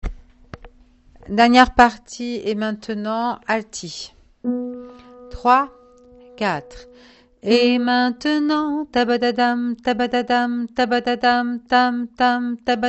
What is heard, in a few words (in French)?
partie alto